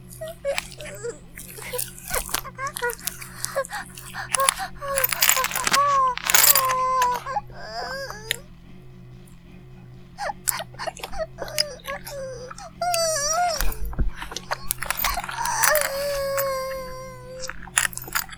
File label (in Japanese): バイブ放置